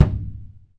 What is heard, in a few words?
Surdo hand mute; E-MU Producer Series Vol. 2; More Studio Essentials; Composer